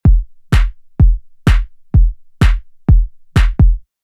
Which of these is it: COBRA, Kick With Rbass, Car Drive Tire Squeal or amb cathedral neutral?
Kick With Rbass